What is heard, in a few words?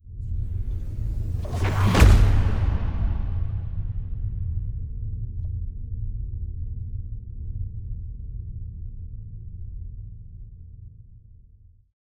orbital ring construction; Stellaris; overlord